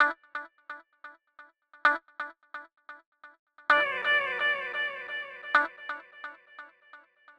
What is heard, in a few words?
Gtr Loop 125, Loungin House; Music-Fx Loops 125; Equipped Music